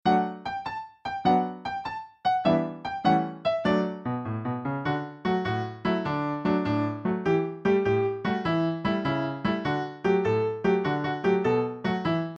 oats peas beans and barley grow instr; folk

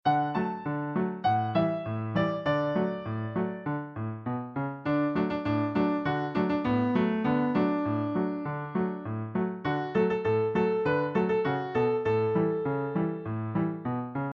instr; Crawdad Song

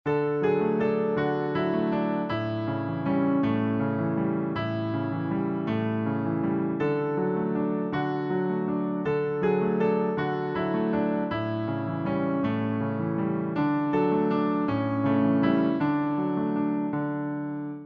instr

